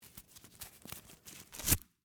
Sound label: Rope Tie Knot, Industry, Rope, Tie, Knot, Nylon, Rope; Hand Tools; BlastWave